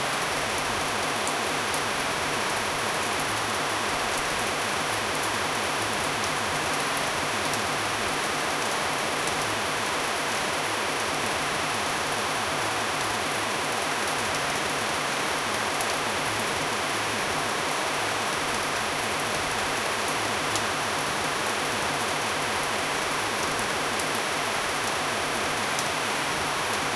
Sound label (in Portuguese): som de chuva suave de